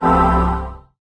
Heal; MapleShrine; mkxp; gameasync

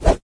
Swing; MapleShrine; mkxp; gameasync

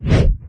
Swing; MapleShrine; mkxp; gameasync

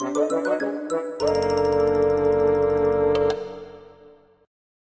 Gag; MapleShrine; mkxp; gameasync

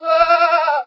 Animal; MapleShrine; mkxp; gameasync

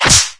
Attack; MapleShrine; mkxp; gameasync

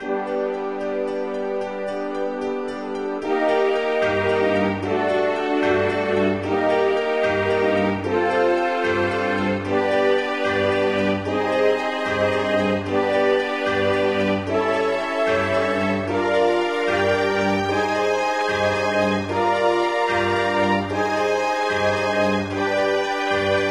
slow; MapleShrine; mkxp; gameasync

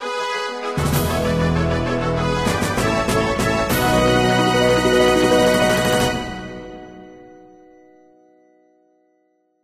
Fanfare; MapleShrine; mkxp; gameasync

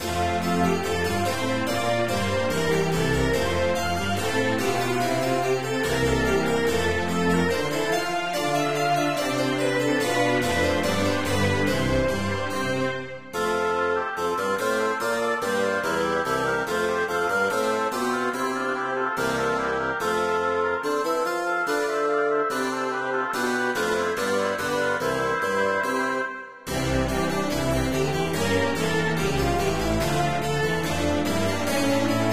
slow; MapleShrine

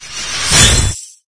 Ice; MapleShrine; mkxp; gameasync

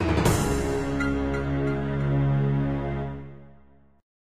Shock; MapleShrine

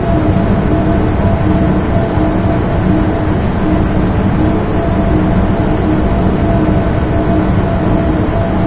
factory; MapleShrine; mkxp; gameasync

